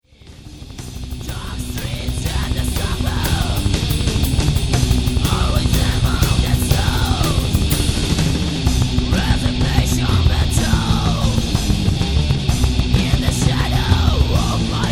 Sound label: bell tolls; tromsnar; resignation